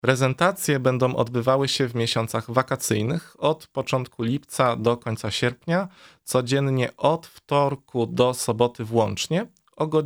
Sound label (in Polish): organy; Letnie Prezentacje Organowe w kościele sw marcina; Wrocławiu